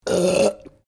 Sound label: Burp female medium, Human Burping